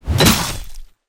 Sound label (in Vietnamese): dao kiem chem ke thu